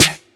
clear acoustic snare sound d sharp key; snare drum samples

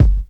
smooth bass drum sound g key

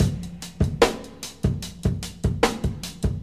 99 bpm breakbeat f key

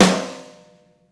ambient snare single hit f sharp key

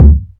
warm bass drum single hit d key

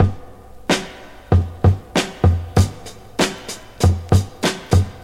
95 bpm high quality breakbeat f sharp key

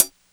raw pedal hi hat single hit f sharp key; drum single hits one shots; hat samples

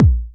rich low end bass drum sample a sharp key

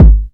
studio kick drum g sharp key; Mono

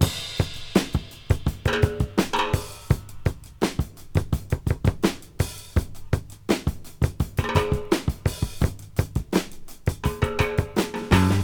84 bpm breakbeat e key

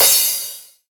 big crash sound sample d key

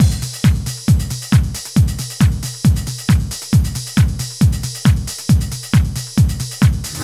136 bpm C sharp electro, Drum Loops

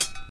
muted pedal hi hat single hit e key

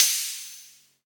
shiny open hi hat sample g key

